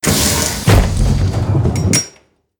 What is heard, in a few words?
Pellet Drop Start